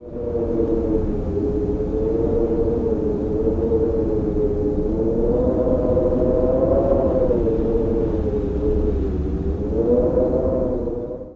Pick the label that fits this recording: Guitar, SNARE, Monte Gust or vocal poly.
Monte Gust